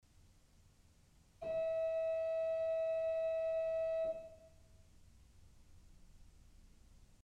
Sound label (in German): Principal 4' e1, ORGEL pipes